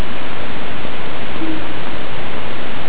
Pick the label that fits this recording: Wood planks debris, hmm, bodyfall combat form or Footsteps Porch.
hmm